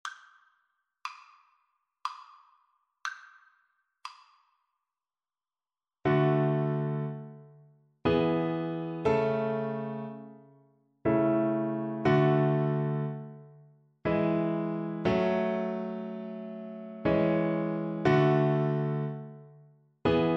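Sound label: viola karaoke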